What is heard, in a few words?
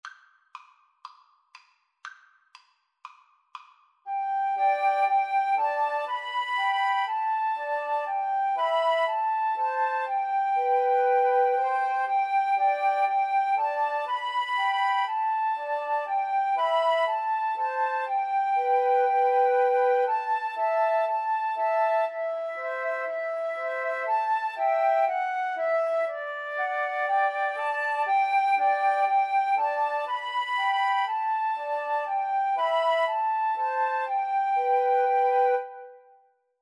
Flute Trio